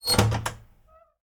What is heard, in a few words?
Door Open; BLIND QUEST - The Enchanted Castle